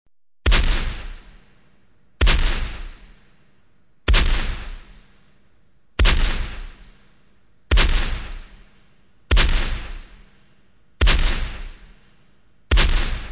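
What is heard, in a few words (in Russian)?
Ружейные выстрелы салют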